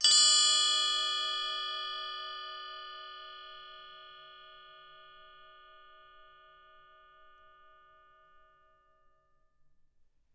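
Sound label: LG TRI left, percussion; Miroslav Vitous - Percussion & Harp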